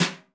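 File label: brush soft; Northstar - New Gold VOL-2; 30S BRUSH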